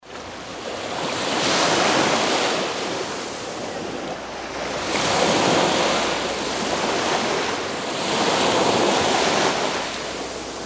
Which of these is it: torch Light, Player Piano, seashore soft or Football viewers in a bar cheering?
seashore soft